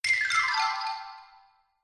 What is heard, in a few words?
wipe